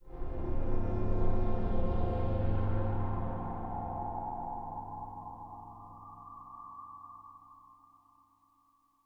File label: chime verb scary; sound effects and noises; atmospherics